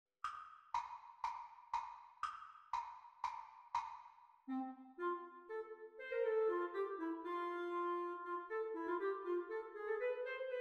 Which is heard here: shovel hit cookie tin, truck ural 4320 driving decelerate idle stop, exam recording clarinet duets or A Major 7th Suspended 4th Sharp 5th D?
exam recording clarinet duets